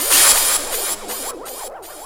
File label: fx scifi; Ueberschall; Techno Trance Essentials